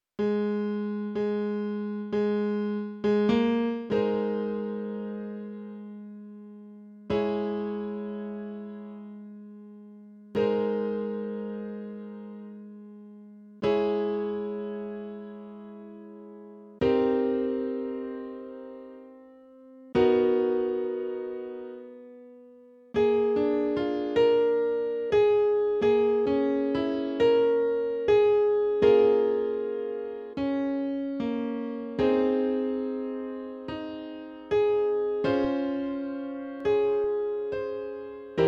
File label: Instrumental